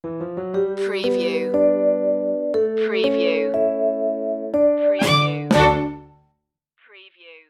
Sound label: classic cartoon transition